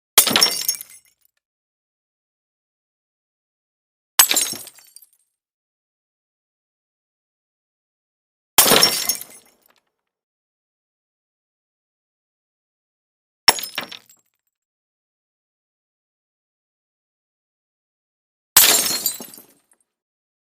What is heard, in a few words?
Breaking glass bottles